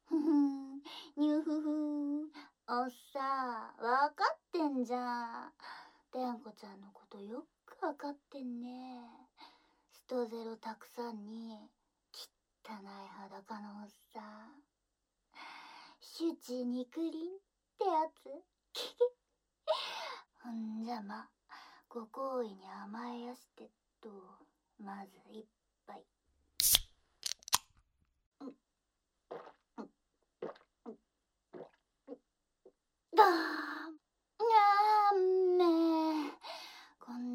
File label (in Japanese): ゲップ・ディープキス; アナルファック編（ゲップ・ディープキス・チンカス食べ掃除・アナルファック）